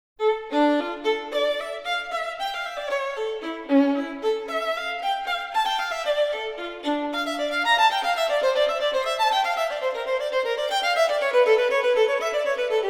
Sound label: Partita For Solo Violin Gigue; Bach; Partita No. 2